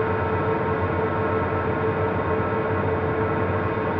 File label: InfiniVerb Noise, sparse soundscape Loops